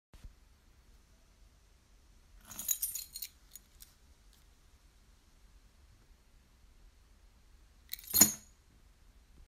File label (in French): Bruit trousseau de clés